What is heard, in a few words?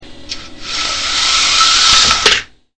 BLINDS